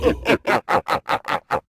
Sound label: bad item; eiffelmedia; brick breaker